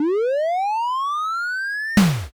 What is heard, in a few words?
defib